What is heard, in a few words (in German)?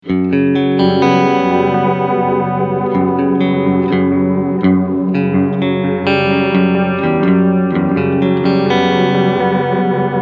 med delay 4 pkt